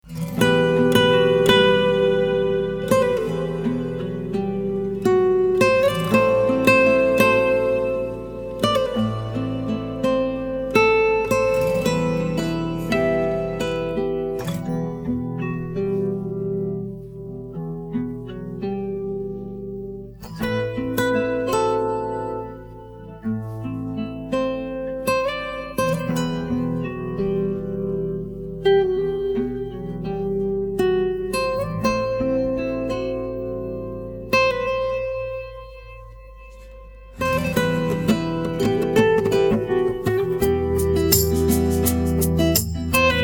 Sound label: Guitar Version